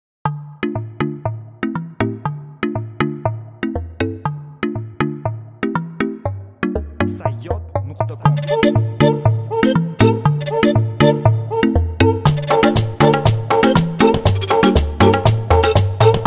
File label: jingle; Jumanji-shanbalik